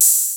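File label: hi-hat open; 300 Drum Machines; Yamaha Motif ES-Series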